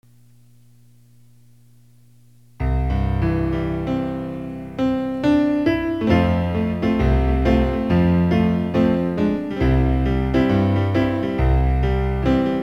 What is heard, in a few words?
piano; PSE Music